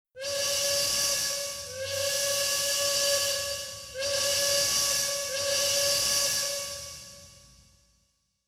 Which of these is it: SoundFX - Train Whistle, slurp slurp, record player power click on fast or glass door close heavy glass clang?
SoundFX - Train Whistle